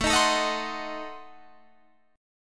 A Diminished 7th A down Guitar Open G

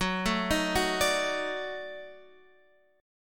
Eb Minor Major 7th Flat 5th Gb, chord

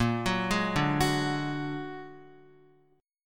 Bb Augmented 7th Bb down Guitar 4E,4A,5D,5G,5C,6F; Bb+7; arpeggiated; JGuitar

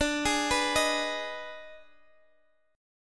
D sharp Major 7th Suspended 4th Sharp 5th, Guitar

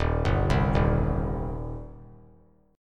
Eb 6th Eb down; Eb6 arpeggiated; JGuitar

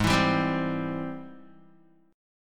Ab Augmented Major 7th Ab Guitar; strummed; Ab+M7; JGuitar

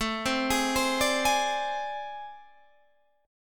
A Minor Major 11th, down, Guitar, Standard; AmM11 arpeggiated; JGuitar

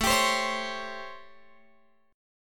A 7th Sharp 9th, Guitar, Open F; A7#9; strummed

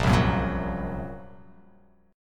Bb Suspended 2nd Sharp 5th Bb down; Bbsus2#5; strummed; JGuitar

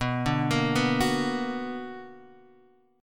B Minor Major 7th, BmM7 chord; arpeggiated; JGuitar

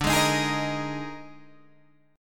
D sharp Major 7th Suspended 4th D, chord

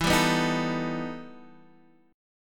E Minor Major 11th, Guitar Half Step Down

